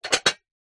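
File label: household plate dishes place onto stack of others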